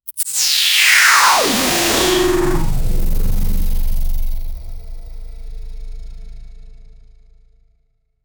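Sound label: mecha weapon science fiction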